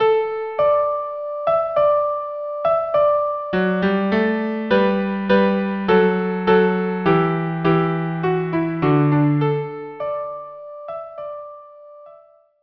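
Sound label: viola duo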